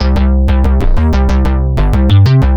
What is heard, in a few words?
Synth Bass; Basses